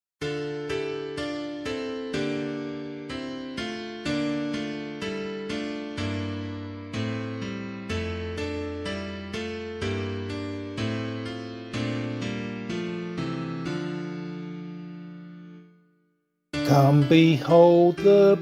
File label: vocal, hymn